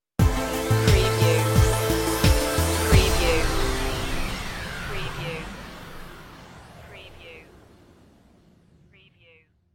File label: falling blossom stinger